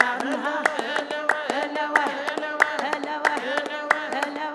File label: CALL R WMN, CLAPCHOIR; Spectrasonics; Heart of Africa 2